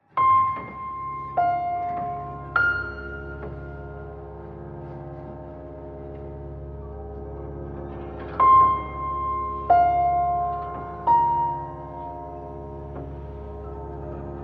Mali Piano

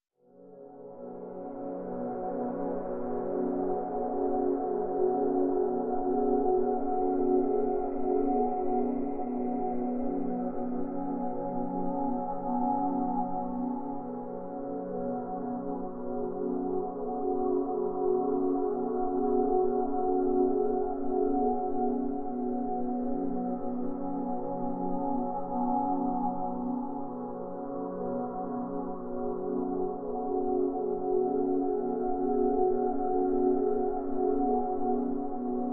Pads Bliss (B)

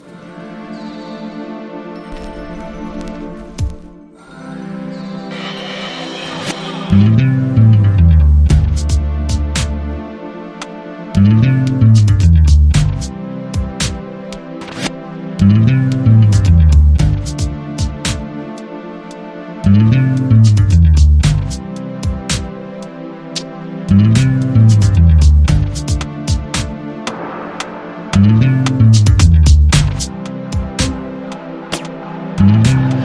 Bass

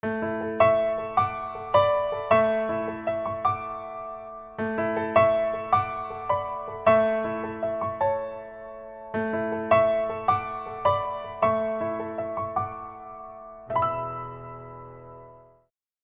Bright Piano Etude

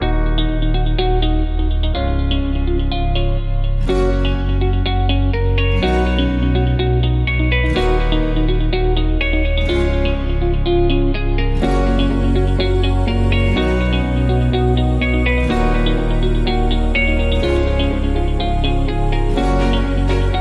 Positive Change, No Drums

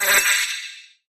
Tube Buzz Sweep

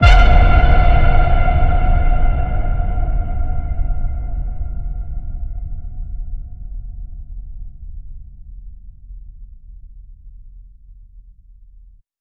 Ping, F

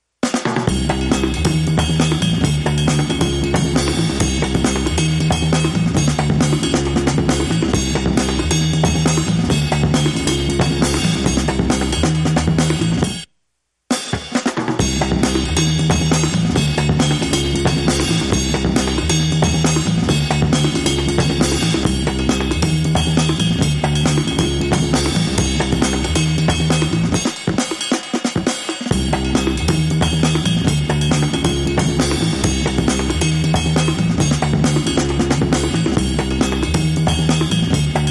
Drums and Bass Mix

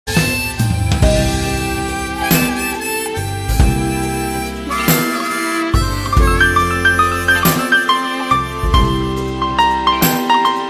Western Blues

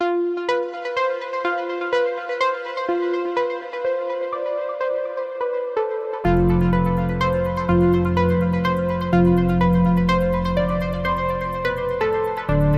Analog Anticipation

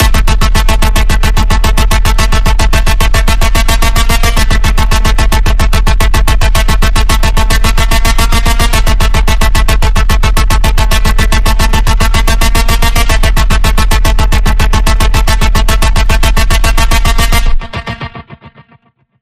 Pulse

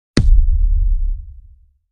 Hit

